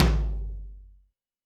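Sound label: Tom Lo Floor Studio, Alesis D4 drum machine; 300 Drum Machines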